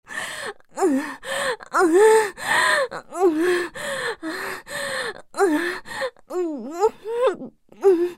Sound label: moan Intense; oppaigames